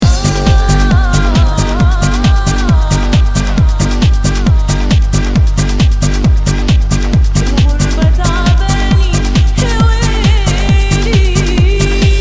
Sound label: arabian